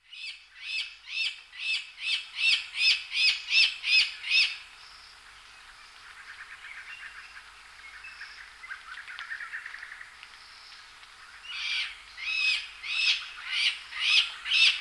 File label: white spotted flufftail